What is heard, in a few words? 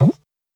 mines bomb; goal